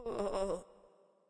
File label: lobby defeat; smite; zhongkui